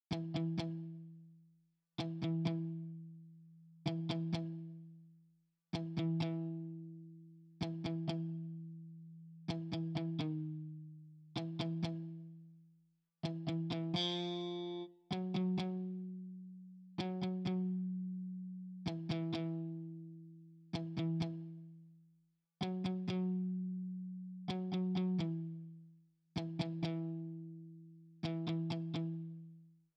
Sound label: Mute Guitar; Beethoven; プロの音プロの技・令和版